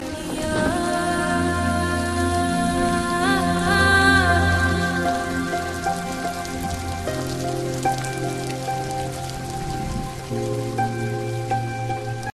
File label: Ringtone